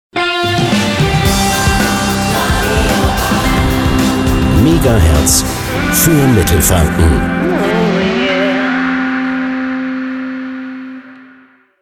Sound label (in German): Transition Fast Rock aktuell to Soft aktuell; Radio 8